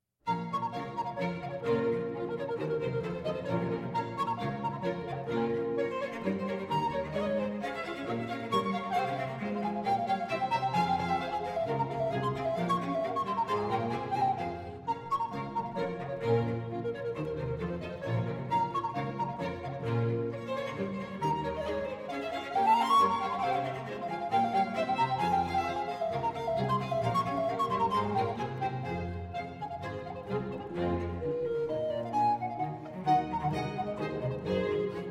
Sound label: Badinerie BWV 1067